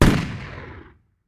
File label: magnum exterior shot